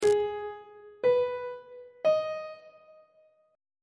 c flat mixolydian chord 6 is a flat minor on treble clef